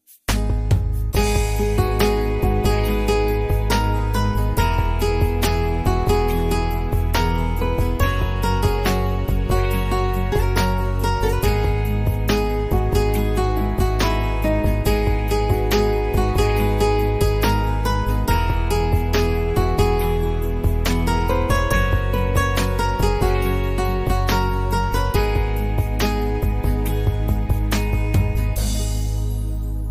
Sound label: christian ringtone biblical tunes